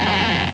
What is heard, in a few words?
door; shuffle-run